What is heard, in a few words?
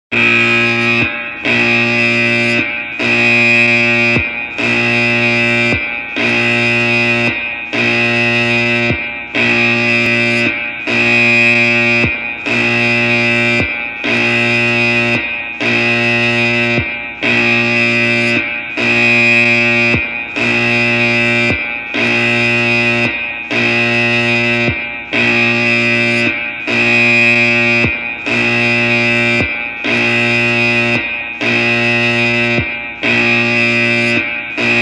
Navy Ship Alarm Buzzer